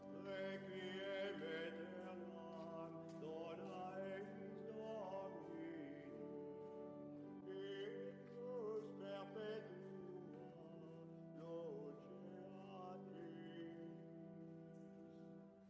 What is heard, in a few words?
Requiem